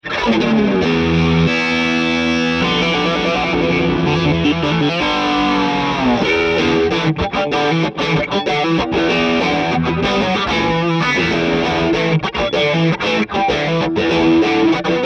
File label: Angry Funk Guitarist